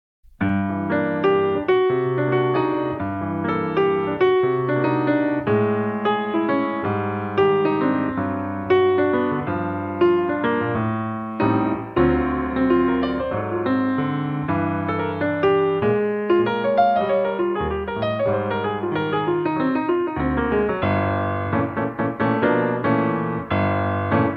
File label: g minor jazz